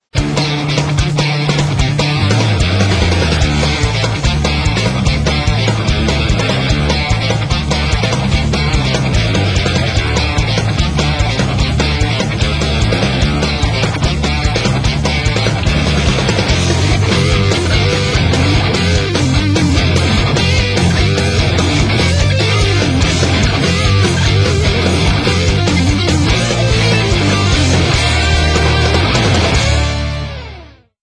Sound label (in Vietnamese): nhac nen nhip dieu soi dong cho video